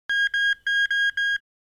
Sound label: Home office printer beeping